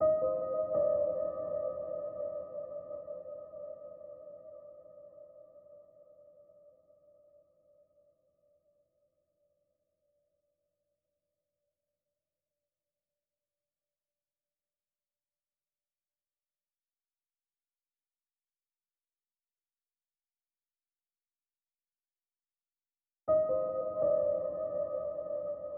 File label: 165 BPM C Min Piano Accent, DnB; Melodies; Loop Stems; Chanced